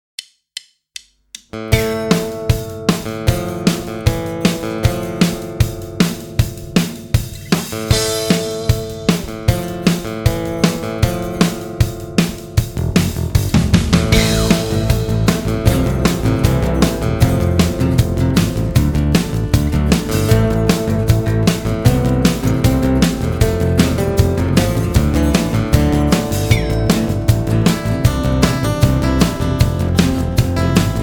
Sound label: (acoustic)